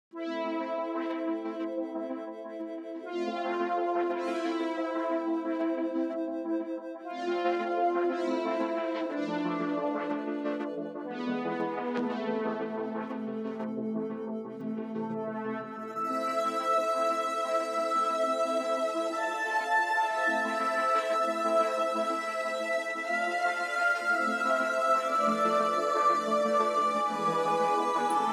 Synths II & Pads

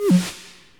Delete

